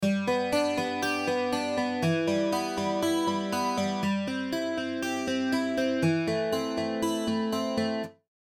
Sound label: Acoustic Guitar DD2